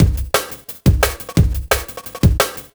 Drum Loops, 175; M8; Electronisounds

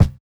Kick, jungle breaks drum sounds

